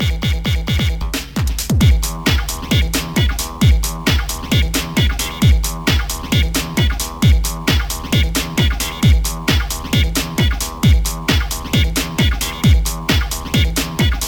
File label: jingle bells